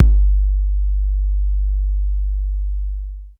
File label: big room kick single shot g key